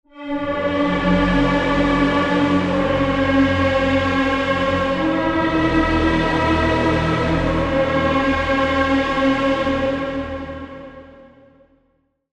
Pad; Black Field; Routed Sound; Vital Expansion - Chaos Bringer Vol.1

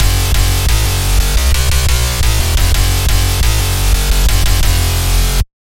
Bass; Chaos Maker; Routed Sound; Serum 2 Expansion - Hyper Gamer Vol.1

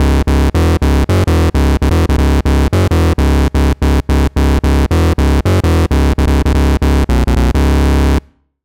Bass; Routed Sound; Sylenth1 Expansion - Hyper Gamer Vol.1

